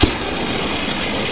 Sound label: jump; openffa; Hover